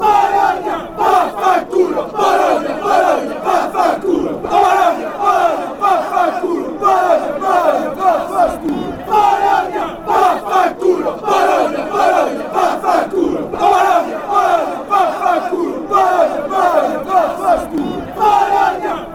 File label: bologna go and, fan chants